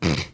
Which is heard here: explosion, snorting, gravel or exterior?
snorting